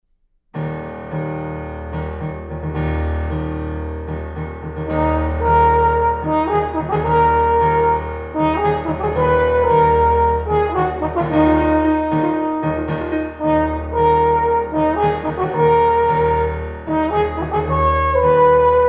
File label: horn sonata